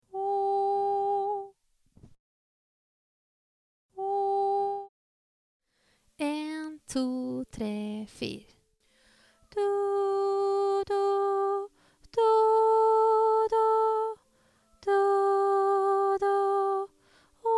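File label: 2 soprano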